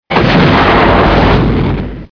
Odlet rakety, Vesmir; Revelation Sight and Sound SK

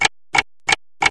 Tikot hodin; Domacnost; Revelation Sight and Sound SK

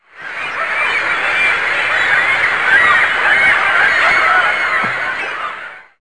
Cajky; Mesto a dvor; Revelation Sight and Sound SK

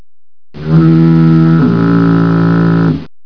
Sirena, Doprava; Revelation Sight and Sound SK

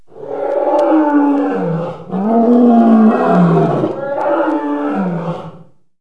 Dinosaurus; Revelation Sight and Sound SK

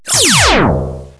Laserova zbran; Vesmir; Revelation Sight and Sound SK

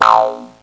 Struna; Revelation Sight and Sound SK

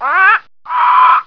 Papagaj, Zvierata; Revelation Sight and Sound SK

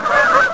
Hus, Zvierata; Revelation Sight and Sound SK

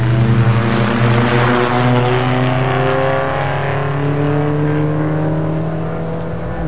Rychle auto; Doprava; Revelation Sight and Sound SK

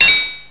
Kling; Revelation Sight and Sound SK

